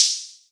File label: OH, hats